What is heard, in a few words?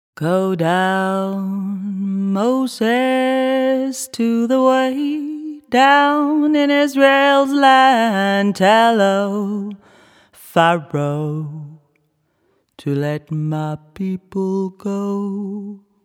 Gospel